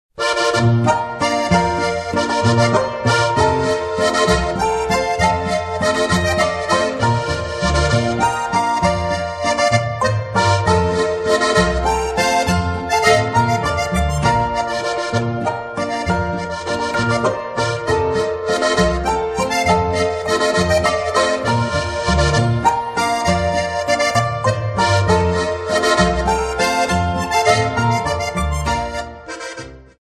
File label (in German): walzer